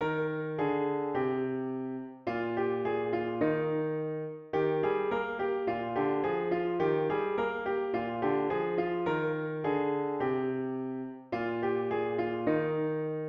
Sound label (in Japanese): ピアノ